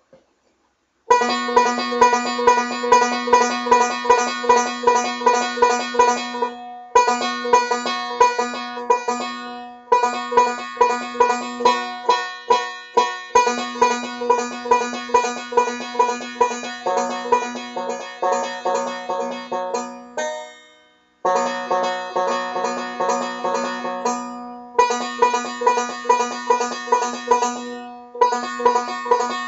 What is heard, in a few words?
galloping, banjo